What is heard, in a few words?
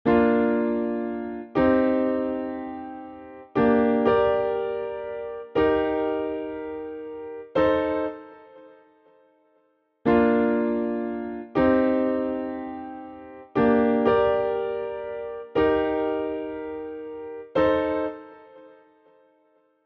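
Bb Major Nice Progression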